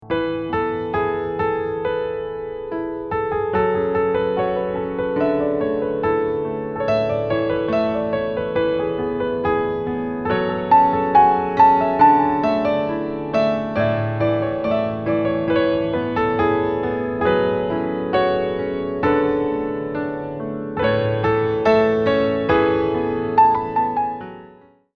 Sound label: Rivers flow impro